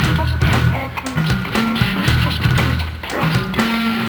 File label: Band Track (plastic); Sonic Foundry (Sony Creative Software); Ugly Remnants VOL-2